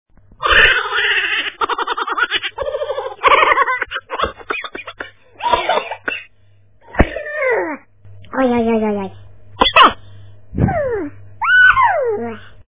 Haha

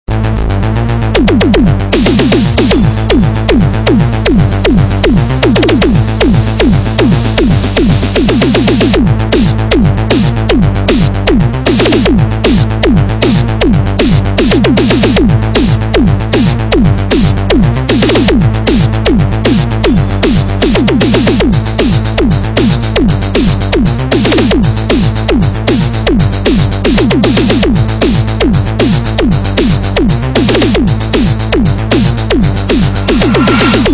TRANCE

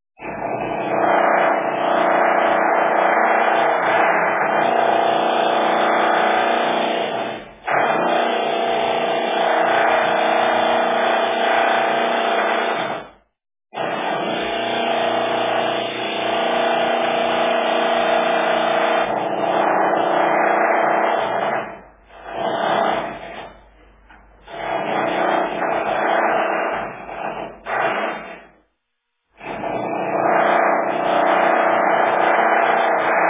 Drill; Дрель!

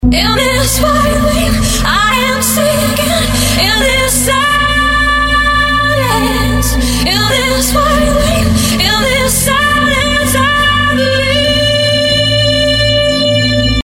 Club

